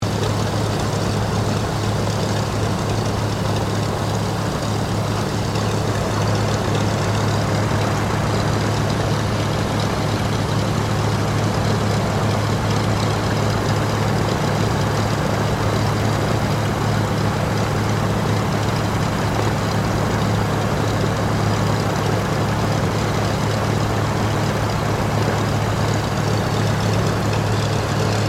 AIRCRAFT DOUGLAS DC3 IDLE; Qantas 1942 DC3; Airplanes; Vehicles

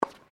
TENNIS RACKET HIT, Local tennis club; Sports

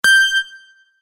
Tap Play, button; multimedia

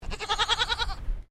Animal Goat Toggenburg Baa; Animals from Edgar's Mission farm in Victoria; Goat Sound Effects; Goats (Sound Effects:Animals); Foundation1

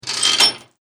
Chain Metal Clanging

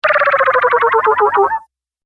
Arcade Game Long Slow Down Beeps; Video Game Sound Effects; Retro Inspired Sound Effects - Think Nintendo, Atari, C64, Etc.

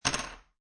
Poker Chips Drop One Chip On Wood Table; Gambling Sound Effects; Lynne Music

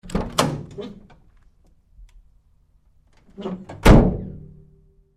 Truck GMC Savana Door Side Open-Close Hard; 2010 GMC Savana; Trucks; Big Room Sound

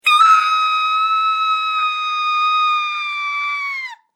Scream Human Female; Screaming Sound Effects

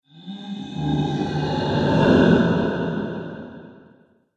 Ghostly Halloween Monster

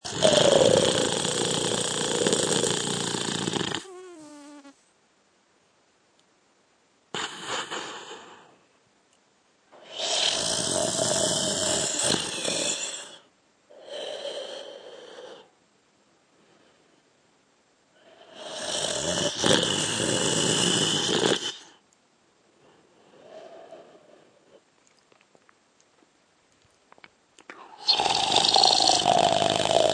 Man Snoring; Snoring sound; Snoring Sound Effects; Human

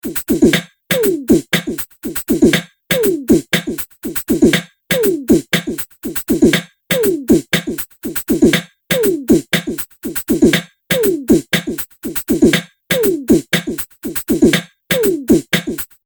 120 Bpm Beatbox Loop, Vocal Loops; Percussive And Rhythmic; Seamless loop

